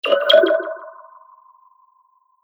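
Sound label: Science Fiction Communication Device On; Sf Comm Device; Sci-Fi Sound Effect; Andrew Fleming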